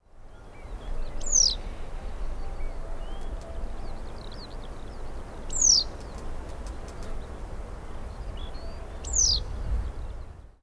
Blue-headed Wagtail

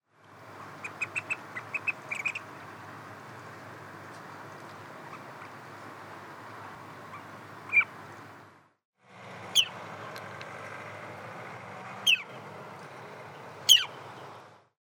Turnstone

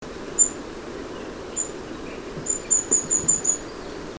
Firecrest

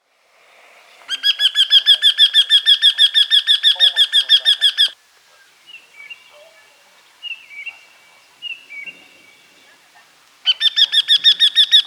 Wryneck

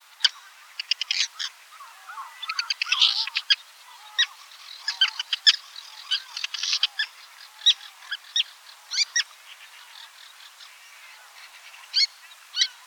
Little Tern

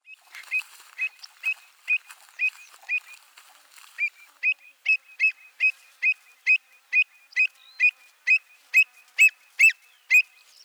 Avocet

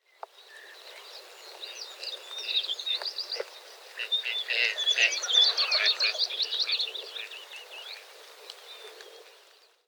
Gadwall

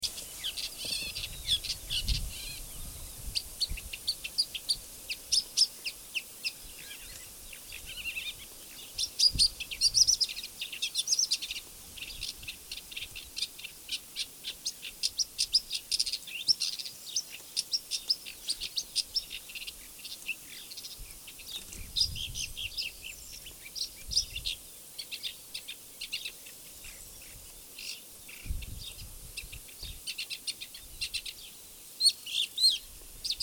Woodchat Shrike

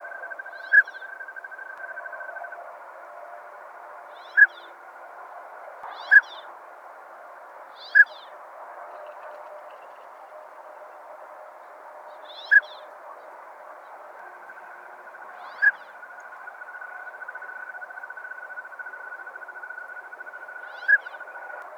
Northern Pintail

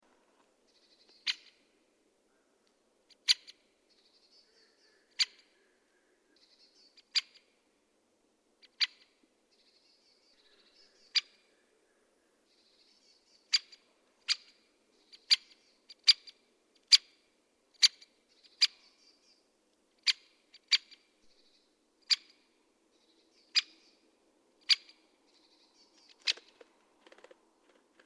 Great Spotted Woodpecker

